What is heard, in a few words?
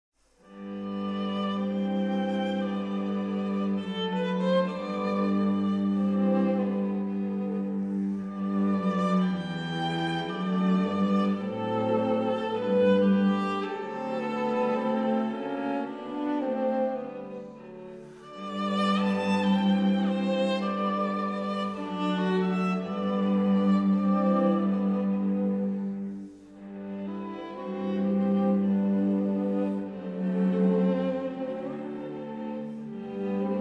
String Quartet in C Minor; Pšibilsk; Ciurlionis